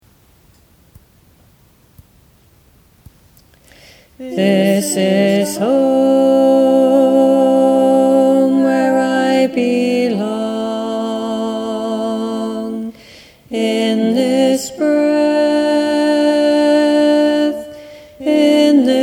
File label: This is Home Tenor